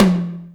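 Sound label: Tom Hi Slam Room, Alesis D4 drum machine; 300 Drum Machines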